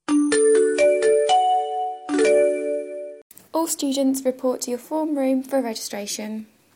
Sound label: Melody